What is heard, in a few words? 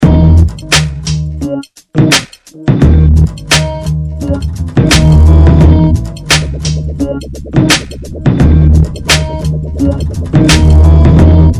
lo fi 115bpm